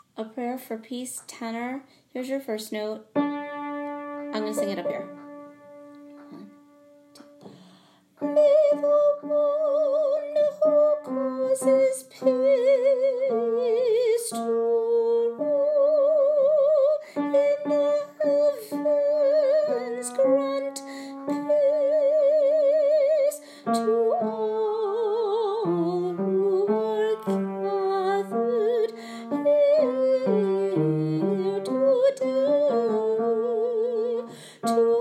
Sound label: Tenor; High Holidays